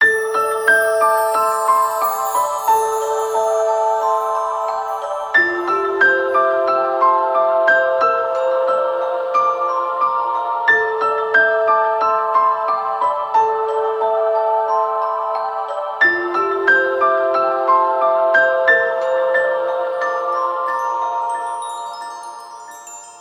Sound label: Whisper loop, BGM